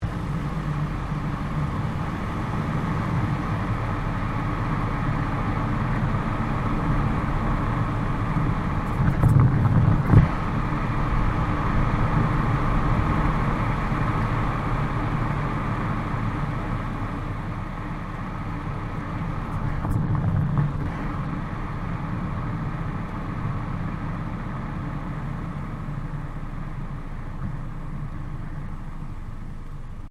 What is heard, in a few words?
Car tyre noise slow catseyes; Cars; Vehicles